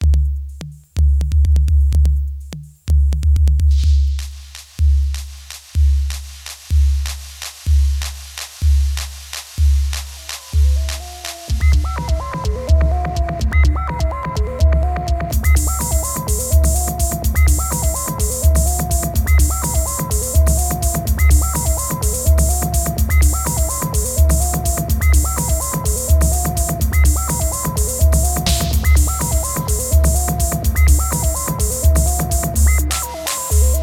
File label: glockenspiel TEAC